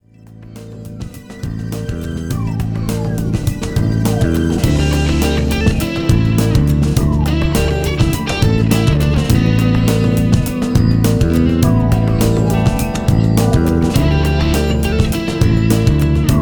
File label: Guitar